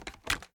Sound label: reload empty fast end